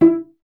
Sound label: STR VC E 1, Cello; Miroslav Vitous; Solo Instruments VOL-1